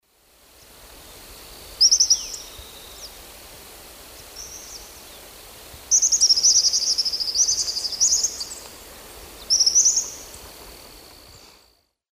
Tyrannus melancholicus, calls; S. America; Eastern Lowlands

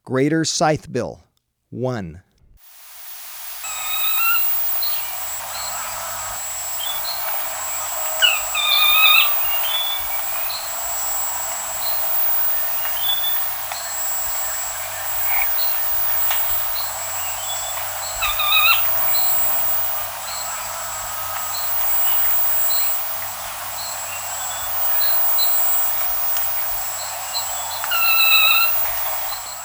Greater Scythebill, Birds of Ecuador; S. America

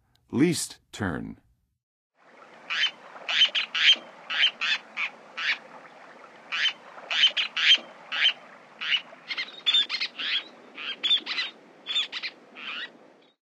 Least Tern; North America; Western USA; Western USA Songbirds

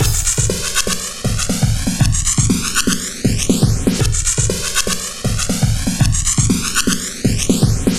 Extra Phased Beat

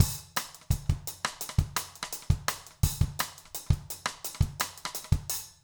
jungle 170

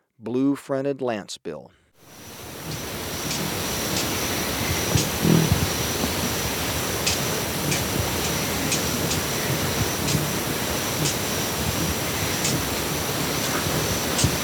Blue-Fronted Lancebill, Birds of Ecuador; S. America

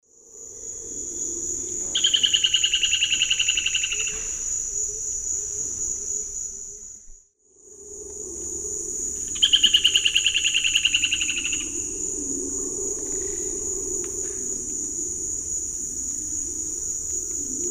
Berlepschia rikeri, song; S. America; Eastern Lowlands

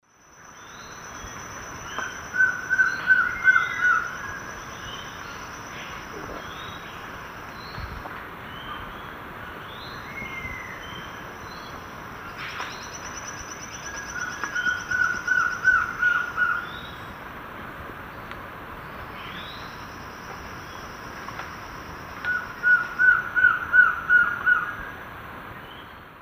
Trogon collaris, song; S. America; Eastern Lowlands

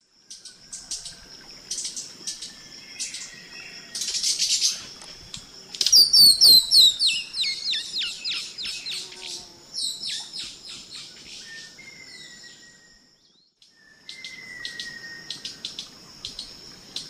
Chloroceryle inda, calls and songs pair; S. America; Eastern Lowlands

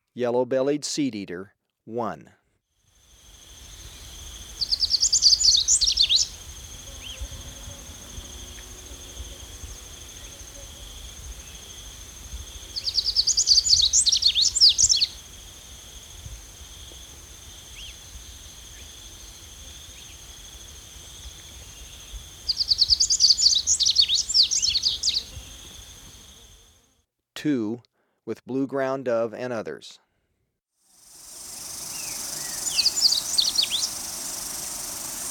Yellow-Bellied Seedeater, Birds of Ecuador; S. America

